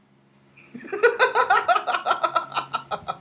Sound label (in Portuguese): risada